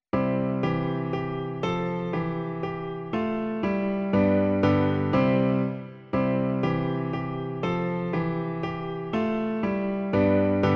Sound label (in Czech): Pán Bůh se každému - klavír; Klavírní verze